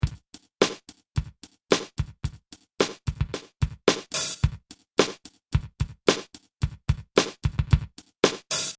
BBox MIDI

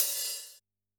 Op Hat, drum

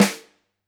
Snr, drum

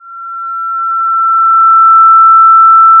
Sound of a police car siren

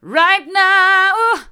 right now hard, vocal

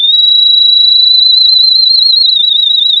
pop, sound of a tea kettle whistling

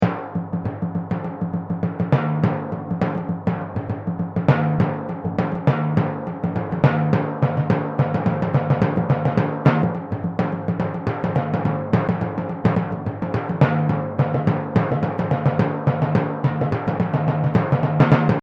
Timpani

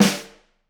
Flam, drum

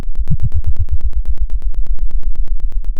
pop, Sound of a thunderstorm

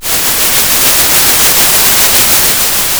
Sound of a thunderstorm

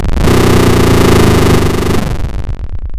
pop, chainsaw

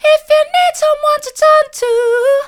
if you need someone to turn to soft, vocal

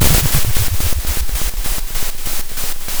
pop, Sound of a drum roll

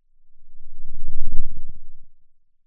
pop

